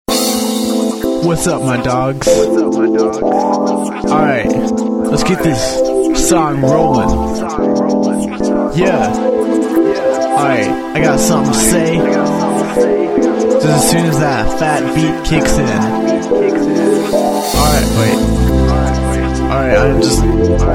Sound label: rap song